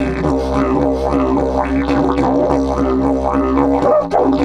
108, DIDGE 108BPM

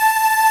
Ending loop

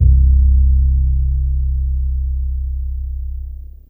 Viol Pizz C C2, Fairlight CMI IIe Pizz Str

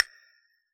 castanet, Yamaha DJX Rock Kit

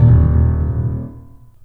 THUD, The Piano; InVision Interactive

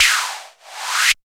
Back n Forth, Special FX; Zero-G Jungle Warfare 1, 2 & 3

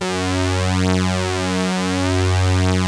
POLY SYNTH; Wizoo - Powered Wave

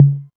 808 Tom Mid, Drum Machines; DrumstationV1

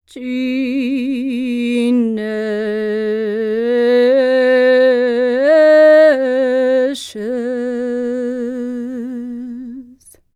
SERBIA, Vocal World Voices SERBIAN

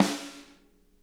snare, drum kits

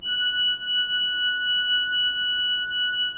3D Organs Pipe Mix Celeste; E-MU Producer Series Vol. 5 – 3-D Audio Collection

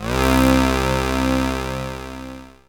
syn c, Dance Synths, Juno; Juno Jam

